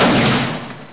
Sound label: pistol shot